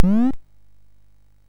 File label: sid MOS6581R3; Gremlins 2-The New Batch